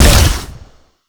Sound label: Fire Shot; Duviri; Incarnon Dera Vandal; Weapons